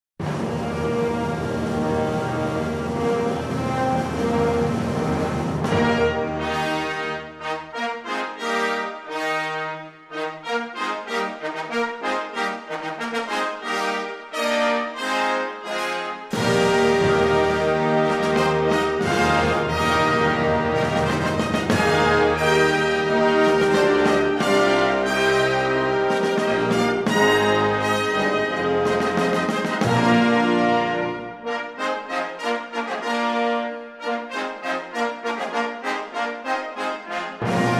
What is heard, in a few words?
fanfare; homage